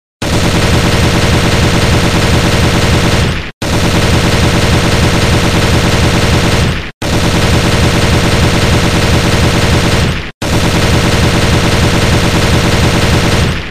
Tieng sung m4a1

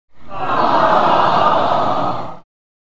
Tieng khan gia o that vong

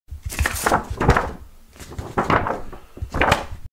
tieng vay to giay ray to bao tam bia

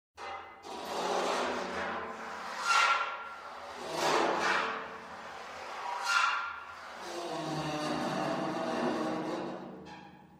Tiếng công nhân di chuyển tấm thép mỏng

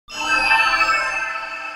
Âm thanh phép thuật xuất hiện ngắn

